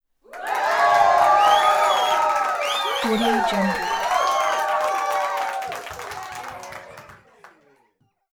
cheering small crowd indoor whistles

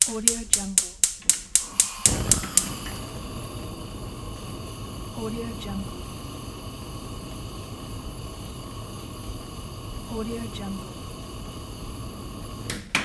the gas stove

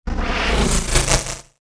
static cling in space

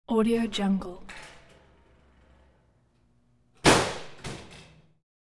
screen door open and slam

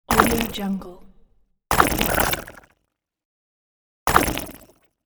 robotic transition game hit ui

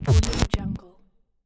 glitch; bogdansfx

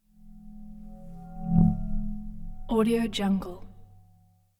tonal ring deep humming pass by whirring; prosoundfx

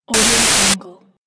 tv static burst

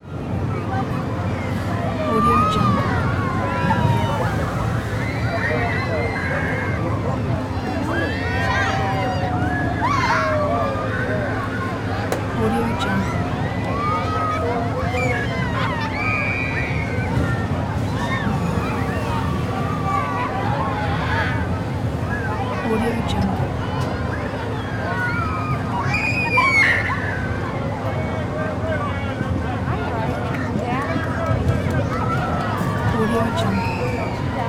carnival ambience; ndeemax

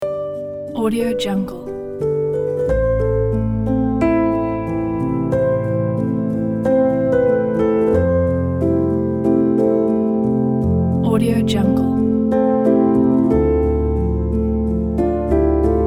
melancholy piano

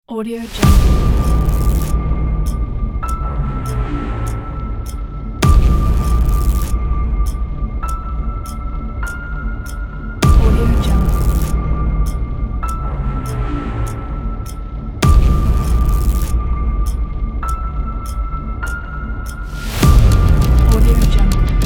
countdown trailer

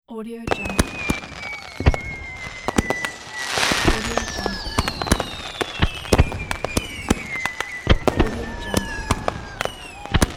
fireworks

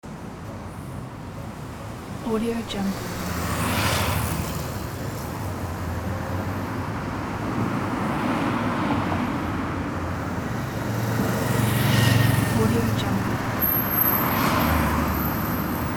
morning city traffic

